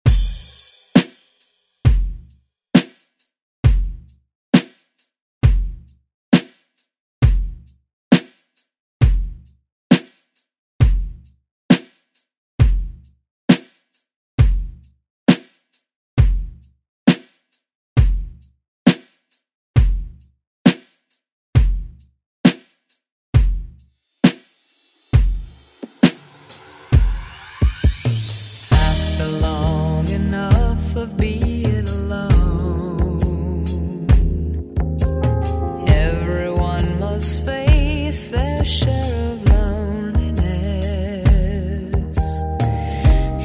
70s ReDrum; DJ Mhark

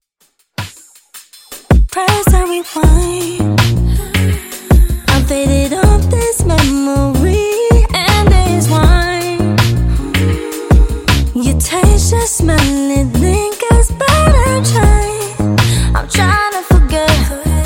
80Bpm; Dj Intro Outro

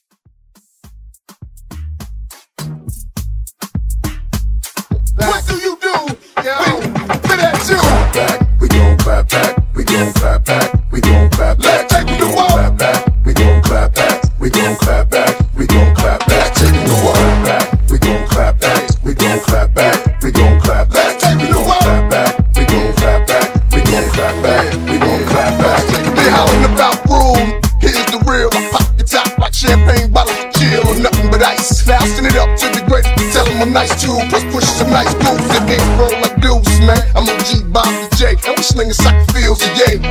Moombah

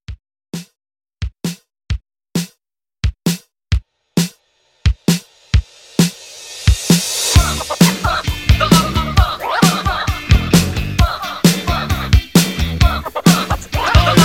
80s Hype Re Drum 132

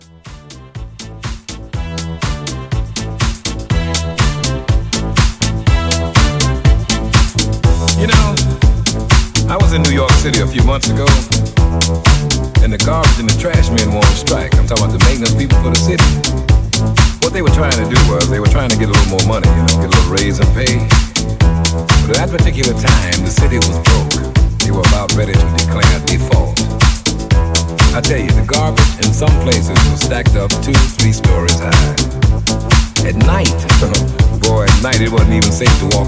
70s Classic Re Drum

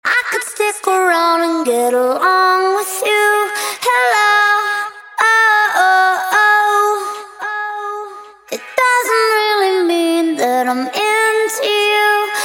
Acapella Intros Reggaeton 110 Bpm

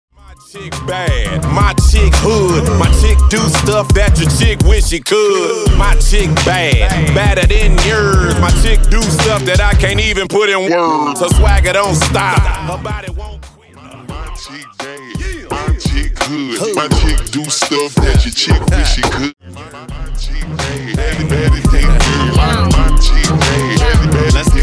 Segue

